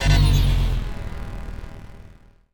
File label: Fail Unlock Not Enough Points; TechTree